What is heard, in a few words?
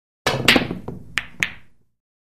Billiards Cue Ball H